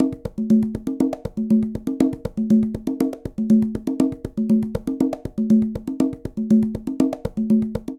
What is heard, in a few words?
Samba 1 Conga Tumba 120bpm; Latin Percussion; Ableton; Factory Packs